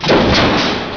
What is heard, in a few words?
door move